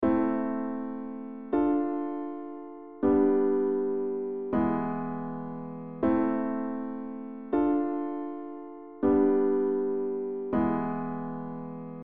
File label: Im IVm VII V